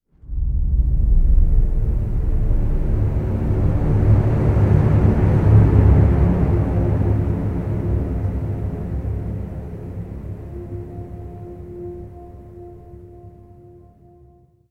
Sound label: strings dark night, Sad; Loops